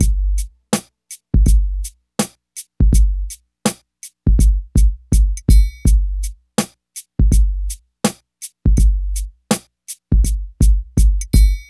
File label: DRM, Hip Hop and R&B Drumloops; Best Service; ProSamples vol.01; AKAI